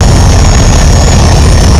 jetpack idle loop

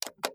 push Button

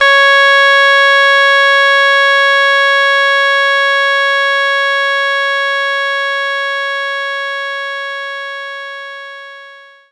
double reed Cs5

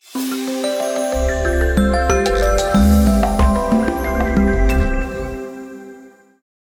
Level Start; Aquascapes Collector's Edition

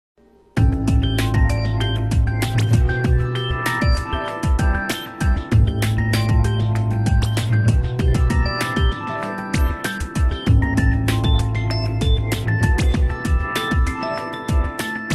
Dance and Electronic; Gran Turismo 6

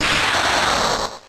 Shockwave; pokemon reborn e19 2022

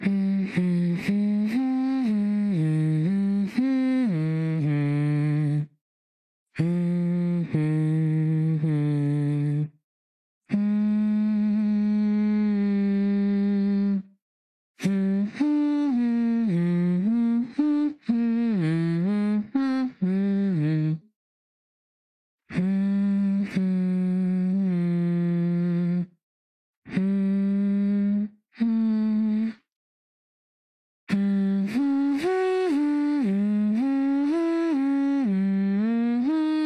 The Letter Theme Humming; the letter a horror visual novel switch gamerip 2021

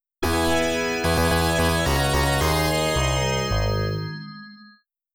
Kick Off; tecmo arcade game chronicle

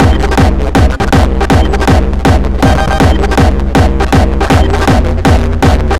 Beats Loop

